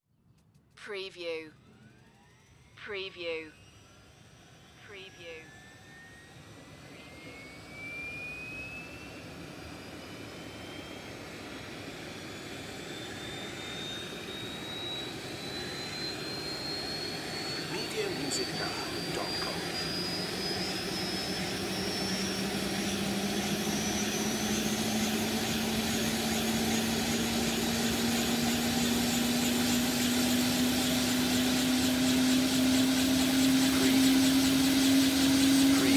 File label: Helicopter Start Up